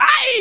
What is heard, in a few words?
AIIE; ROADRASH